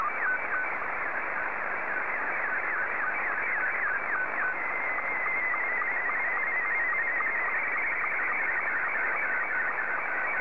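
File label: Scottie 2, slow-scan television; JL1FQZ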